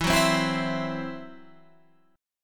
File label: E Suspended 2nd Sharp 5th; 24,21,22,21,24,23,x,x